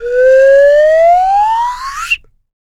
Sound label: whistle with sliding tone, Cartoon Film Effects